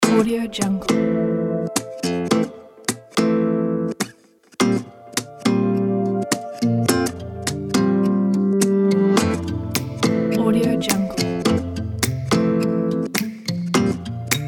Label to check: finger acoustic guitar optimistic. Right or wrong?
Right